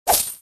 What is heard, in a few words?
Coin Steal; Dwarves Boss Dwarf